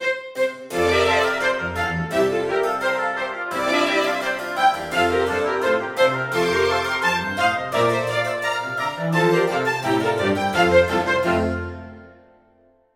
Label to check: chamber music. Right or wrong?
Right